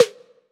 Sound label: SN Poppit